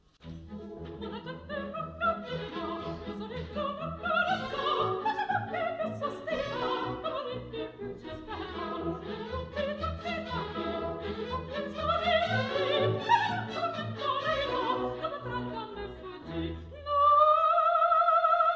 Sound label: bass